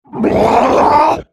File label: spellcast effort